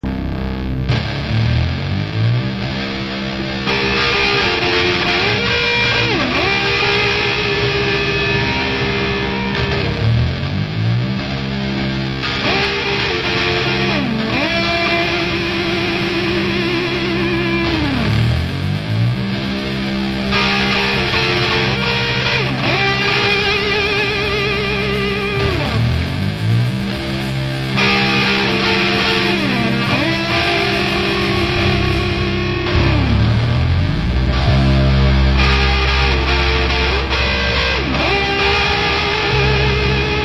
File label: electric tone; ELECTRIC AMP INNOVATIONS, LTD